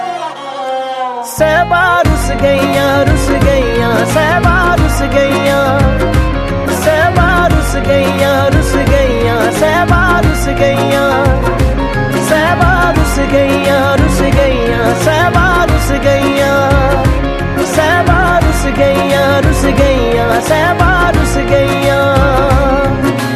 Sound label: Punjabi Ringtone